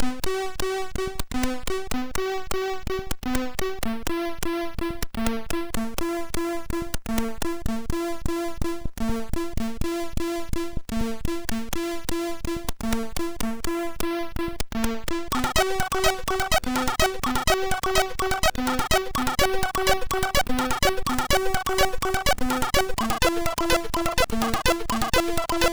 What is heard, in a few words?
sid MOS6581R2